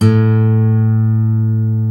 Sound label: GUI AC.; Sound & Vision; Gigapack I CD 2; GUI ACOUSTIC 1